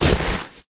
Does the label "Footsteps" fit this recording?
Yes